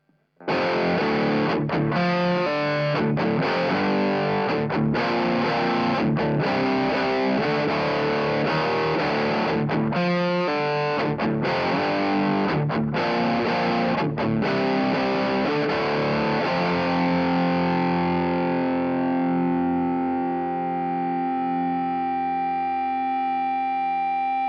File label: wider mic